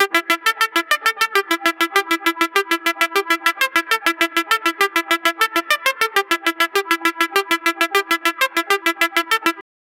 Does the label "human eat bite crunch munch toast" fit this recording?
No